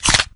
chomp, imp; doom3

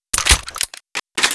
railgun reload

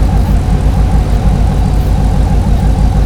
gauntlet idle, simulated vehicles; gta5

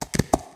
horse run

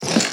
gear

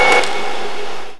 minigun wind down; weapons

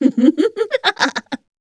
succubus laugh long; queen girl

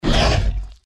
deathclaw strike; npc

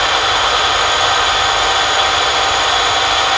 rocket warmup; bms objects

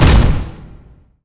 step heavy

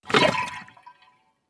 flamethrower reload

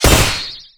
glaive melee hit

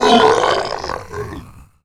injured

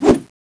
slash, crowbar; tfa cso; crowbar craft

